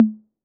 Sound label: Tom Hi A 808, Clean, Digital